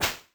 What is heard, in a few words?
hit; Incantation